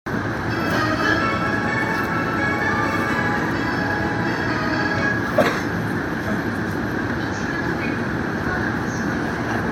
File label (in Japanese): 京浜東北線浦和南, train sounds